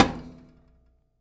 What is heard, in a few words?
Metal